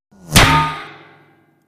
Bullet with Whir Impa, metal

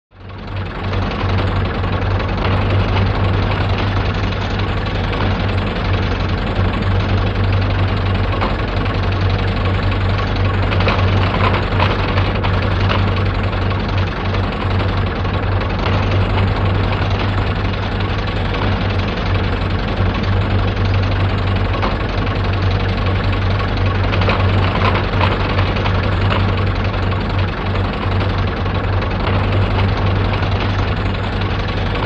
Diamond Drill Heavy; drills

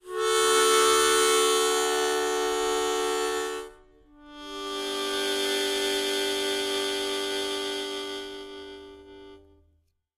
Mouth Organ, harmonica; woodwinds

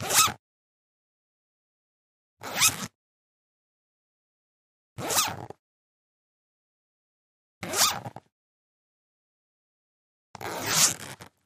Zipper Thin Fst Moves X; zippers

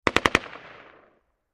Ak47 Machine Gun Burs

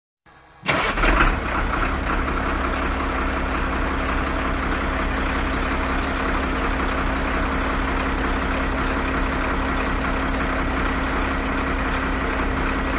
Garbage Truck Start

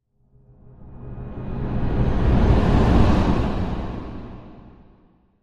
Music Eerie Tonal, cymbal

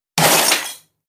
Glass Impacts Debris; windows & mirrors

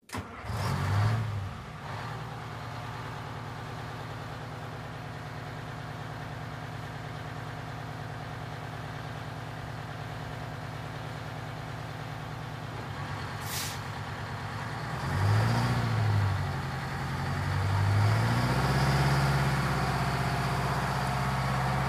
Fire Truck Start Idle

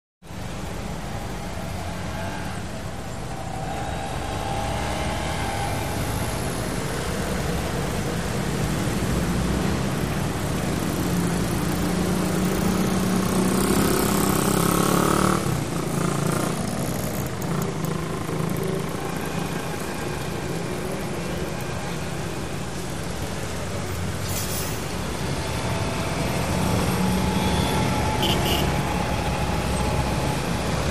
Bangkok Thailand Urban

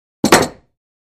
Free Weights Exerci

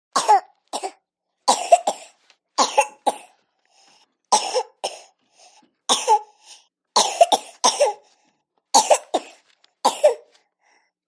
Baby Girl Dry Coughs, nineteen month old

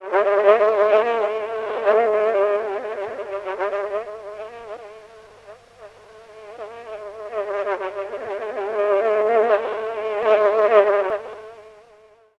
Fly Buzz Loop

